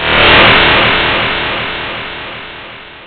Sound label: Warp; Woden 1.3.4